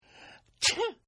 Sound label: Sneeze; Sneezing; Human; Partners In Rhyme